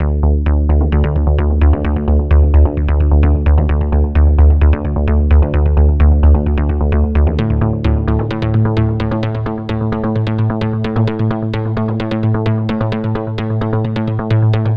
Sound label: Bass Arpeggiation, Afterhours EDM; Sonic Foundry (Sony Creative Software)